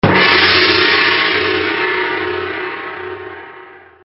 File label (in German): Timbal Pauke; mistral 600